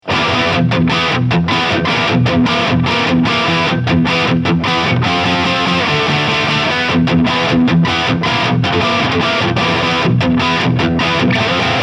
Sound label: Schecter SLS Elite C 7 Lead Modern